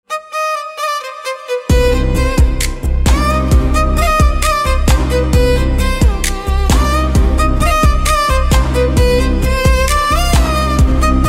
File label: Violin